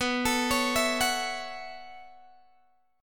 B 9th Suspended 4th B down Guitar Open G

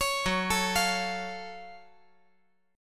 Gb Minor Major 7th Gb down

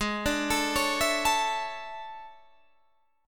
E 13th G sharp

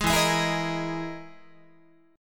E Major 7th Flat 5th down Guitar; EM7b5 strummed; JGuitar

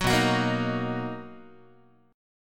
B Major 7th B down Guitar; strummed; JGuitar

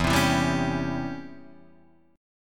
Db Minor Major 7th Flat 5th E

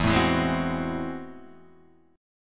A Minor Major 13th

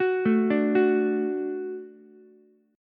D-Major, D, Ukulele; 11,9,10,9; arpeggiated; JGuitar

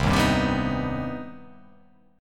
C Minor Major 7th; strummed; JGuitar

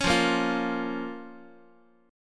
F Suspended 4th Sharp 5th, down, Guitar; strummed; x,x,4,3,2,4; JGuitar

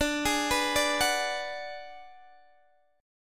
D 6th Flat 5th D down Guitar DADGAD, chord

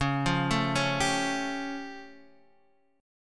A Augmented 7th Db

